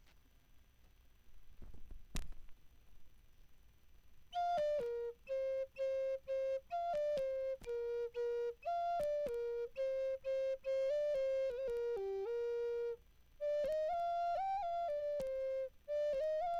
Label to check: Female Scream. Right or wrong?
Wrong